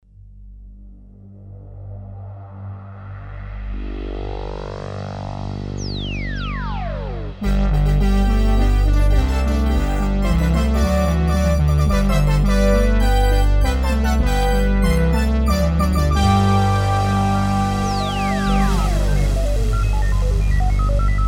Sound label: fanfare